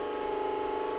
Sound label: Mechanical circle; puzzles